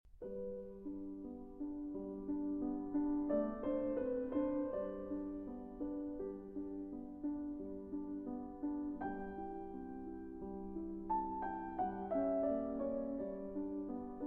Piano Sonata No. 16 in C Major, K. 545 Sonata Facile II. Andante; Mozart